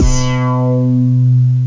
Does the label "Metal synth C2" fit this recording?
Yes